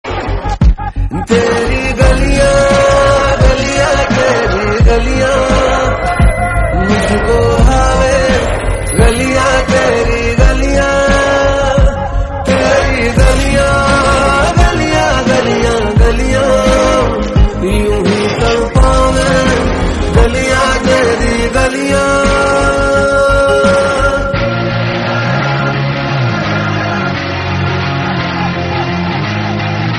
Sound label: Hindi Song